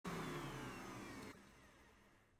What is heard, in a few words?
Household Chores; Big Room Sound